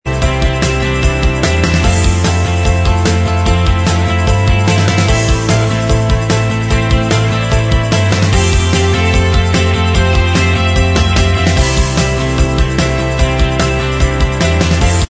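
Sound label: instrumental, backing tracks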